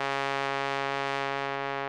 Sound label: tenor trombone Db3